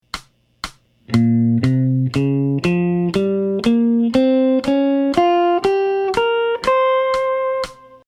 Altered Dominant Pentatonic Scale, jazz guitar

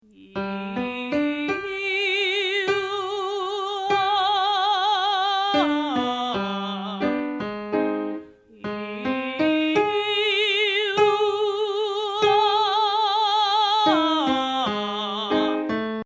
Sample Advanced Voice Exercise; Vocal Texts Resources

